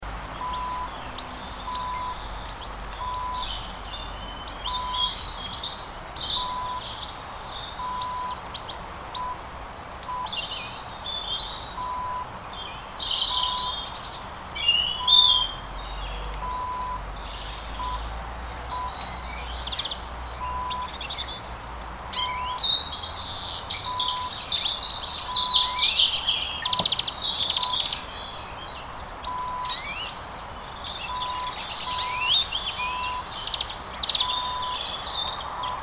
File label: Quiet Birds Country Winter